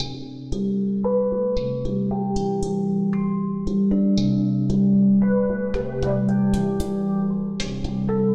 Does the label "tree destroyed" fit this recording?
No